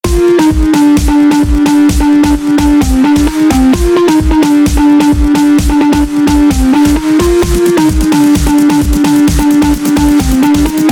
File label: phonk song